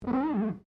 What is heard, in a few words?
GLASS SQUEAK FINGER